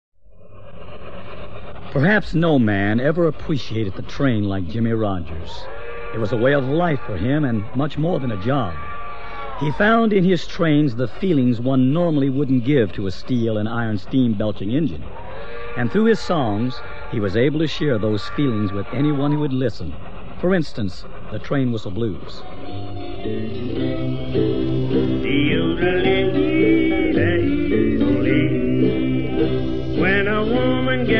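Country, Western, Bluegrass, Folk, Old Country Music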